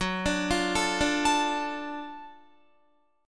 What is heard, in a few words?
Gb Minor Major 7th Sharp 5th Gb down Guitar; arpeggiated